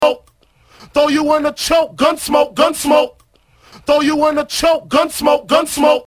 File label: Gun Smoke